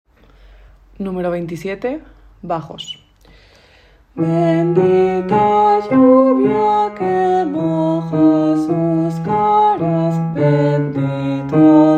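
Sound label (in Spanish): muerte bajo; Bodas de Sangre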